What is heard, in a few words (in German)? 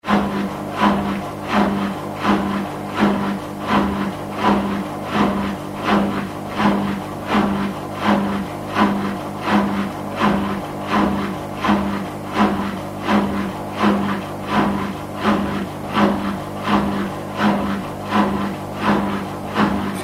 waschmaschine wasserpumpe